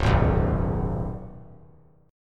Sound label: C sharp 7th Suspended 2nd C sharp down; C#7sus2; strummed; JGuitar